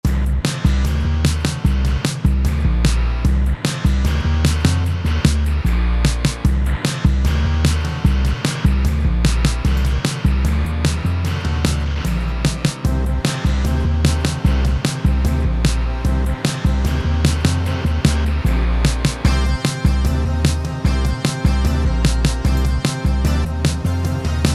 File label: Flight 150BPM Explore Epic; Dragons Destiny OST